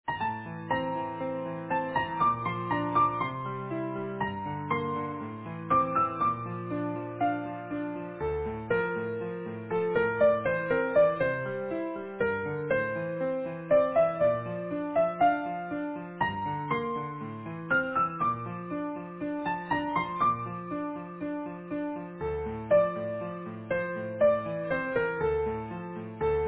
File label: Easy Piano